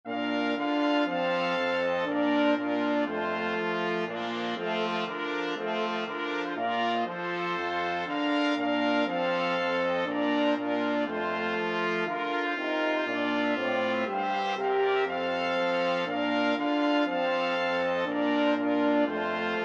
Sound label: brass quartet